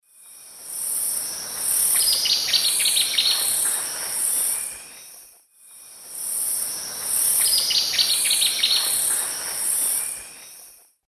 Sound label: Tyrannopsis sulphurea, calls; S. America; Eastern Lowlands